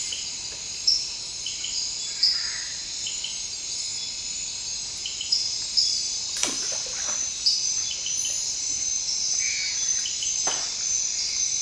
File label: Pristimantis; guyane